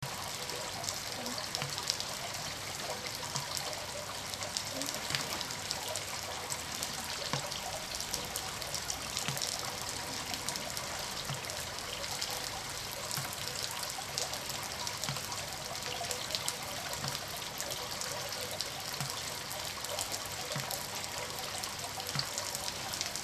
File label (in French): jour de pluie